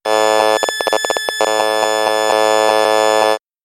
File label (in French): Antenne relais telephonie mobile GSM; mesure hautes fréquences HFE35C 27 MHz à 2,7 GHz; Ondes électromagnétiques et électromagnétisme